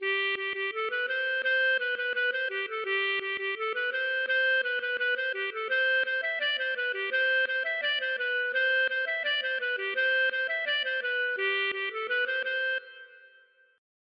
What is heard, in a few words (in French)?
laride